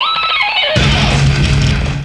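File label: wall hit; The Dream Machine - The Virtual Sexual Experience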